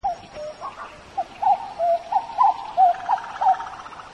2 samce kukułki; kukułka; głos alarmowy

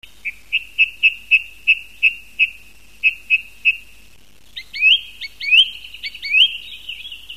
drozd śpiewak - nawoływanie; wroblowe; turdidae; turdus; Jest objęty ochroną.

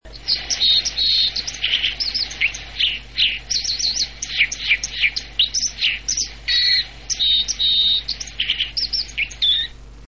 pluszcz; wroblowe

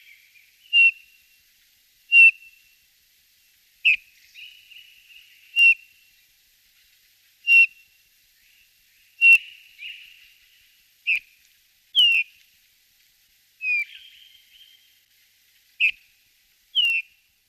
gil - nawoływanie

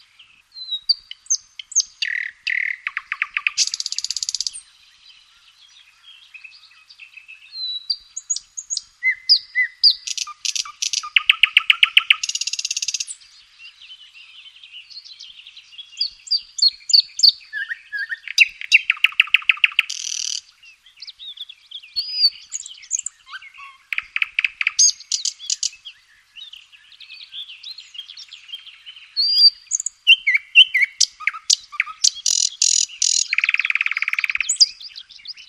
słowik szary - przy dalekim wtórze innych ptaków; wroblowe; turdidae; luscinia

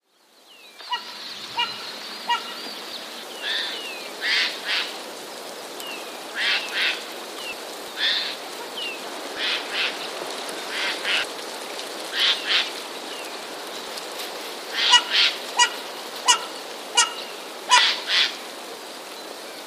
sojki; jedna sójka specyficznie szczeka

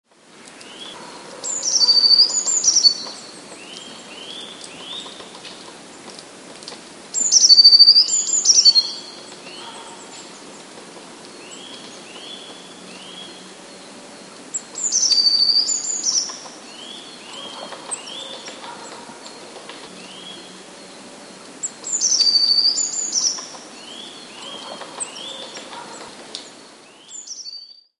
modraszka; Modraszka należy do ptaków śpiewających

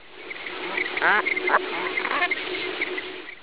bernikla obrożna

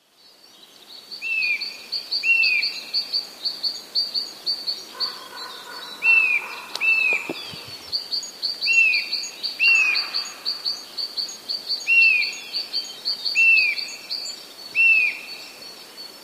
kowalik zmienny; W tym przykładzie kowalik zmienia gwizdy opadające na rosnące. Robi to naprawdę szybko, bez dłuższej przerwy między odmiennymi rodzajami gwi

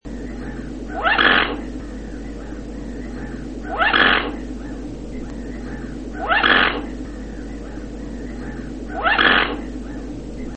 łabędź niemy - chrapanie

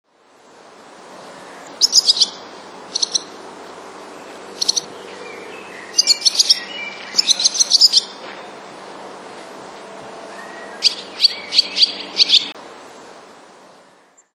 dymowka, szczebiot prawdziwej dymówki; wroblowe; zapozyczenia

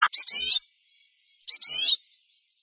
kulczyk - nawoływanie

